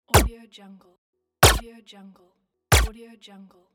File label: silenced gun shot